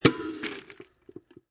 Straw Insert, Straw inserted into soft drink cup plastic lid with slight ice rat; Straw inserted into soft drink cup plastic lid with slight ice rattling; Miscellaneous Human Sound Effects